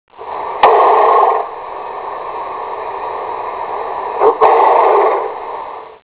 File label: snort, goats